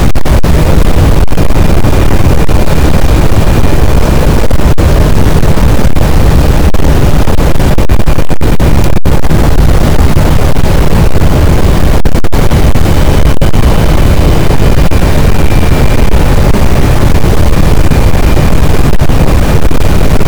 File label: cachalot, boat; Physeter Macrocephalus; SNR 6dB